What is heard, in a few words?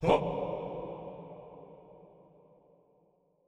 Landed; netent